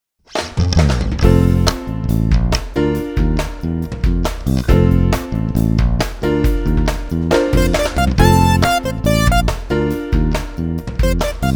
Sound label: Gime Some Bass